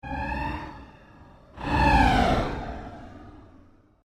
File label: Created Cyber Whale Call, Alien Sound Effects; Created creatures, vocalisations and pretend speech